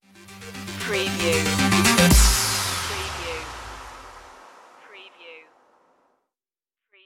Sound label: electro circuit stinger